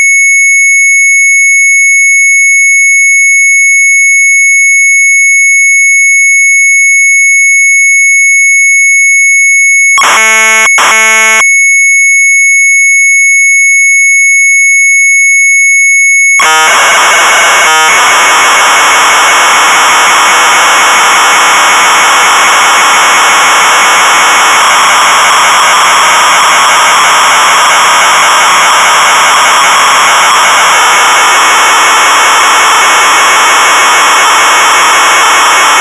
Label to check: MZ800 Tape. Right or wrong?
Right